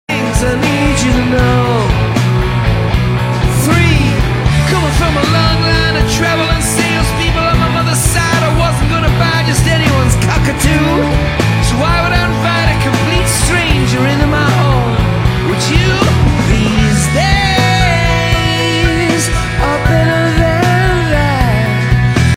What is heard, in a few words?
Breathe